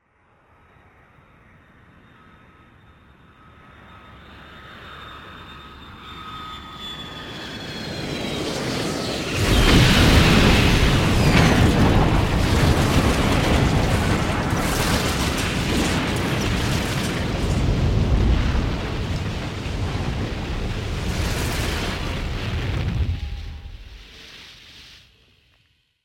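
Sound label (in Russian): airplane falls at an angle and continues moving on the ground, breaking apart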